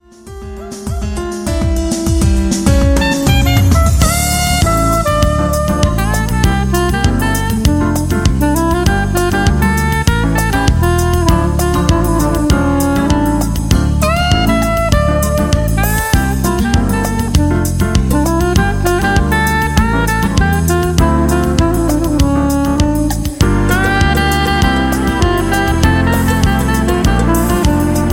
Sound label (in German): Sopran Saxophon